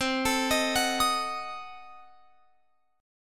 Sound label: C Diminished 7th C, Guitar Open F; Cdim7 arpeggiated